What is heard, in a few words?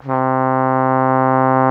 CORNET C2; MONO BRASS; Greytsounds VOL-1